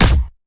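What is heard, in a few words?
punch, impact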